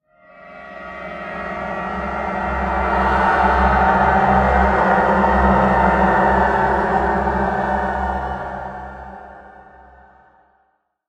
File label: Ambient; Rise of Nations: Rise of Legends; Czin